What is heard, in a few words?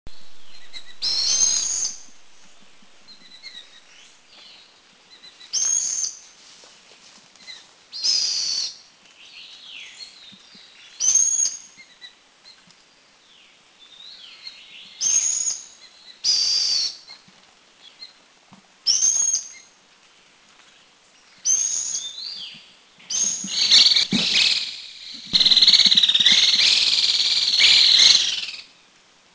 Rufous-crowned Laughingthrush Bedongyueshan; Garrulax ruficeps Country endemic; Taiwan